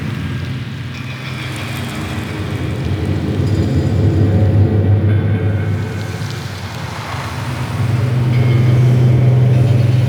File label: Fortress Interior Amb